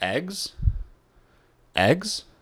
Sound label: Eggs